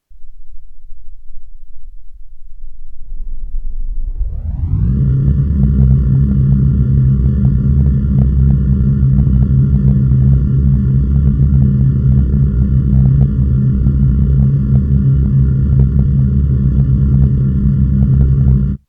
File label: Granu power plant